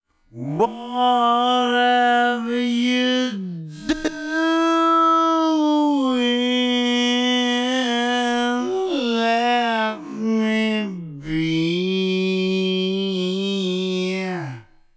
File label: Singing Voice